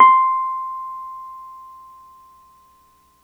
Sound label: Piano Wurl c5 soft; TrashLibrary